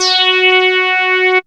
SWEEP F#3; Best Service Alpha Dance vol.2

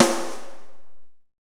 KOMMERZDRUMS

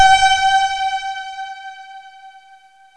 FM PAD; FMSTORY#2

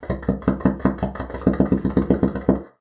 BASSOON; Xsample VOL-6

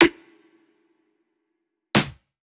Two Shots Snare; Sliced

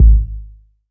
Yamaha CS1x Drums

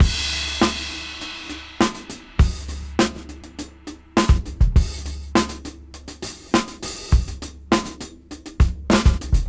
Tricky 101 BPM; AMG - Keith LeBlanc Phat Butt Jams

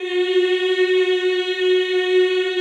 CHO F#3, Eeh; Eeh + Ehh

